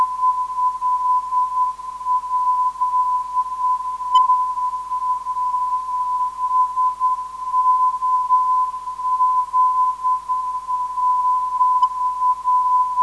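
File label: non-directional beacon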